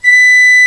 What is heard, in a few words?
Piccolo mf B4; Digital Sound Factory Virtuoso